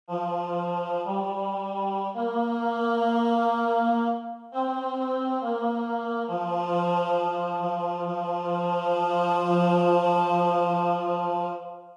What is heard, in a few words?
Bass